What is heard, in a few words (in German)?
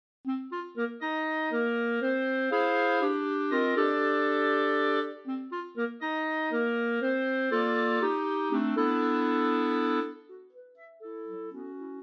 Klarinetten Quartett